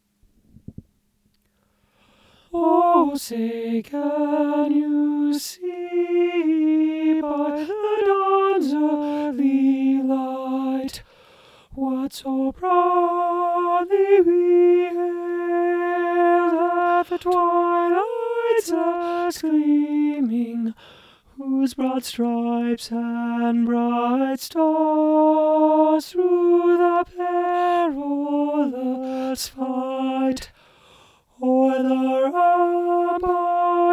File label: alto low